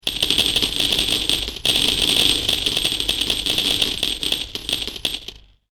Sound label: Payout Falling Coins, slot machine; multimedia; Andrew Fleming